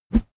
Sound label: whoosh bamboo; swooshes-swishes